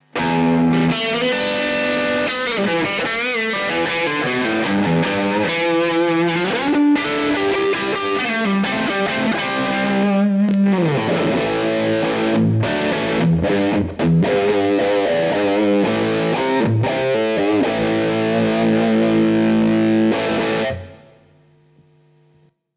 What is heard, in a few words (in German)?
Amber Overdrive Custom Mittelstellung